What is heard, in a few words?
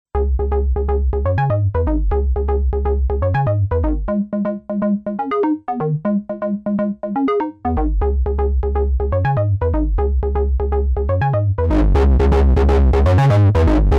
Korg Monologue Shape Drive; Anfem